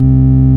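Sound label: ORG Vox, Continental organ; Continental2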